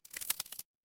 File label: glass crack